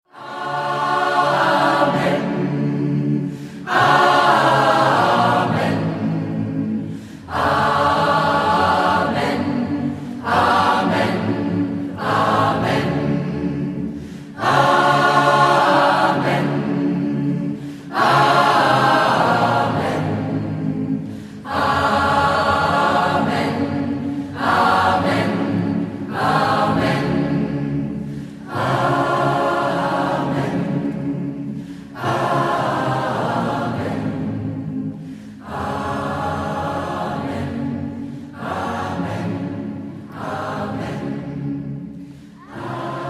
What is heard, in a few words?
Amen, gospel singers